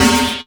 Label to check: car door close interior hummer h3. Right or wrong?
Wrong